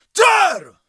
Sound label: Battle Cry, Viking Vocal, Un-Processed